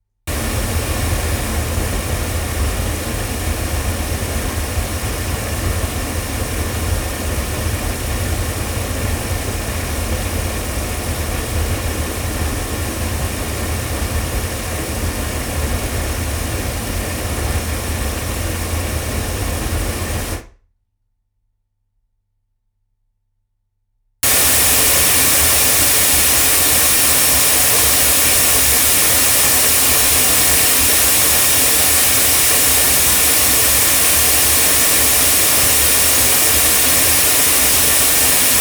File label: Neumann TLM170 omni loudspeakers